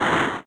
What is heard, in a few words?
snow skid